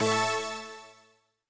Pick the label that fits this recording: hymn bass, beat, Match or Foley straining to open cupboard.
Match